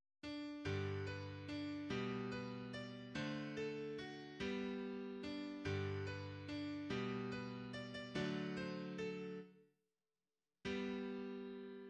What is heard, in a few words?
Tonal